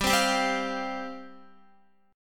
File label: G 7th Suspended 4th G down Guitar